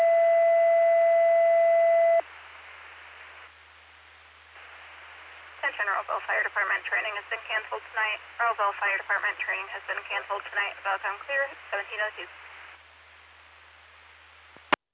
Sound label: Fire; Earlville; TTDAlerts